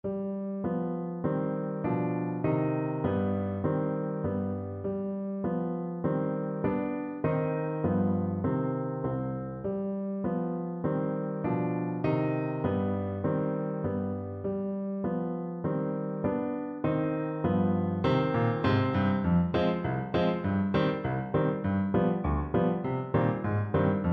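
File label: Sivivon, alto saxophone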